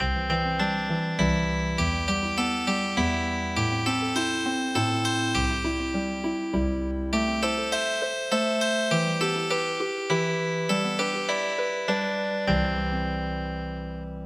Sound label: Healing Harp; log boat